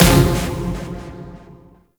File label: EDM; Warframe; Warframes; Bard; Bard Instrument Packs